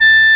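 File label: sheng G#3; AKAI S6000 CD-ROM - Volume 1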